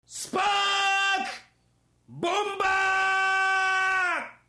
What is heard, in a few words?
bomb; Giga Wing 2